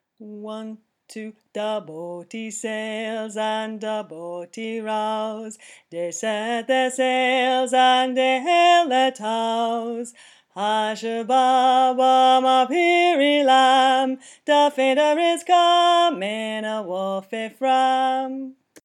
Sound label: Da Boatie low part, Folk Choir music